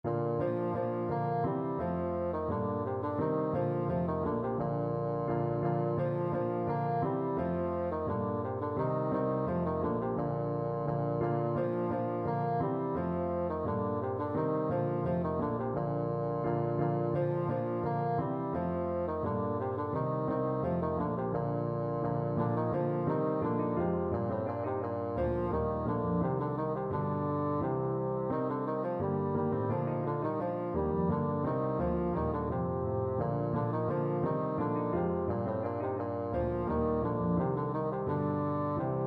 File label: bransle, bassoon